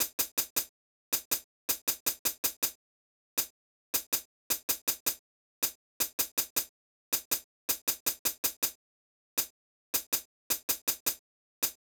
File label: Hat, Drum Tracks; Hip Hop Exotica; Big Fish Audio